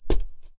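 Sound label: footstep wood; 0ad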